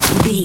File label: footstep snow land